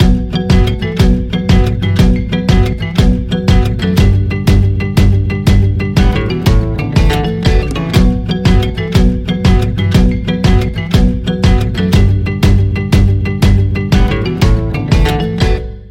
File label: Guitar Ensemble